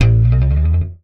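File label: slap, bass; Xsample VOL-1; SLAP+THUMB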